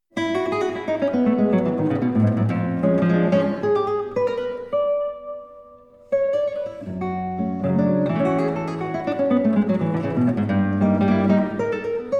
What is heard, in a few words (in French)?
Etudes pour la guitarra No. 7 Très animé